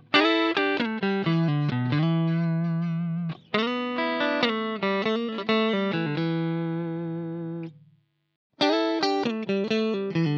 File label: Strat Clean Lead